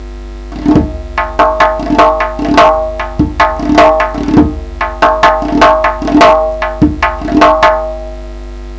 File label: 9 beat rhythm